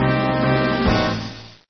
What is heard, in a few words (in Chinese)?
铁匠 战斗失败音效; Blacksmith